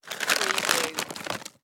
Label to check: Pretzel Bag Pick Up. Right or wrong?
Right